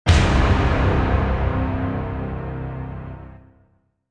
Bad News Chord; DJ sounds

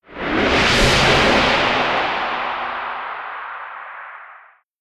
whoosh; Whooshes and sweeps

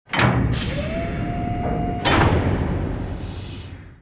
Open It Up, DJ sounds

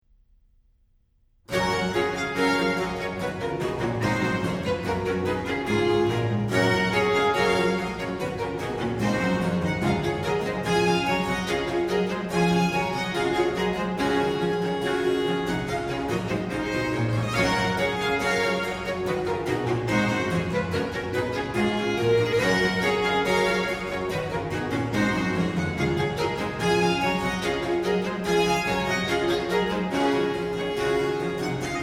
Allegro, Concerto In G Major For Strings; Concerto Barocco; Antonio Vivaldi; RV 151 (Alla Rustica)

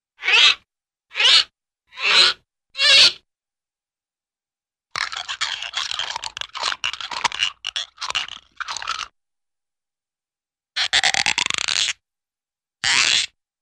Short Creaky Squeaks; Hollywood Edge Cartoon Trax

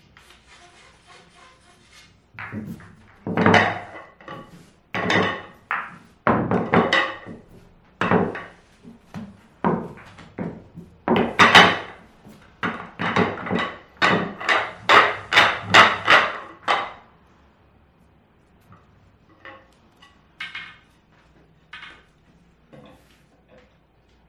Pool Game Sequence; SOUND EFFECTS1

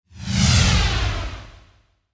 Slide By Whoosh; DJ sounds

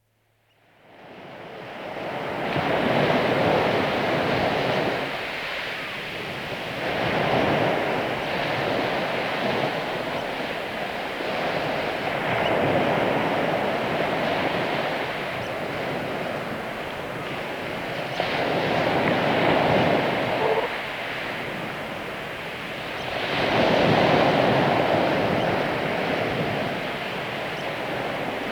Gentle Waves On the Beach; Dr. Sound Effects; 210 Nature Sounds - 20 Hours of Relaxing Natural Ambiences for Meditation and Sleep

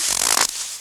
SNOW, FOOTSTEPS; 1000fx

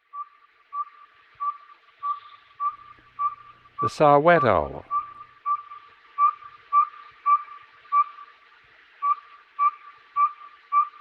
Saw Whet Owl; Western North America; Dan Gibson; Wildlife Identification By Sound

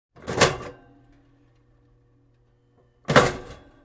Electric Toaster Bread Down & Up, Household

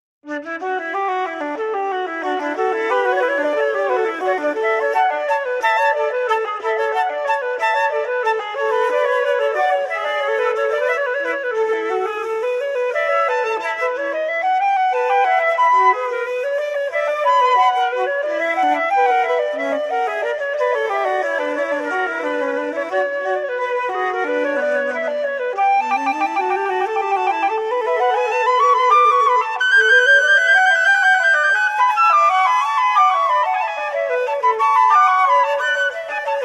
2 part Invention on 2 flutes; Andy Findon; Bach and Telemann; Johann Sebastian Bach

